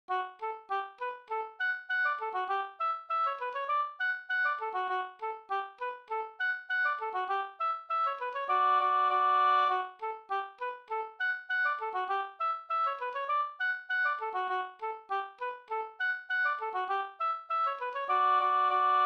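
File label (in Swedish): polka polkett, D major; fr Brunn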